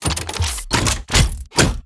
Heavy Tank Railgun; blue archive

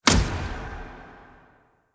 Spotlight; blue archive

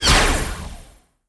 Focus Line; blue archive

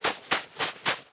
Sand Run; blue archive

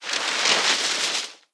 Pour Paper; blue archive

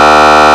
horn, sirens; code3 excel

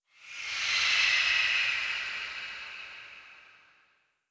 Roar; blue archive

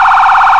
emv priority, sirens federal; federal signal pa 640

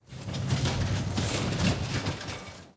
Vehicle; blue archive

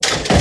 Vending Machine; blue archive

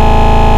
horn, sirens; code3 banshee

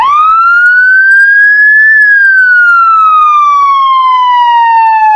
wail, sirens; whelen std

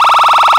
pier, sirens; gta

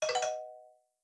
Ring; blue archive

